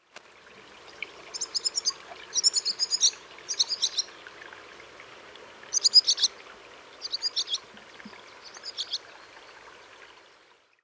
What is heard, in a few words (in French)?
hirondelle rustique cri